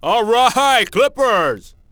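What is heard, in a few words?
"Clippers", basketball fan; NBA Inside Drive 2004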